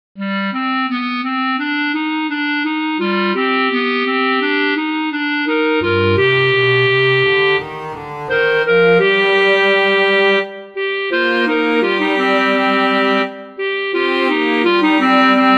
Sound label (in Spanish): El condor pasa (canon); Daniel Alomia Robles